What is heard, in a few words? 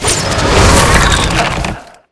captivate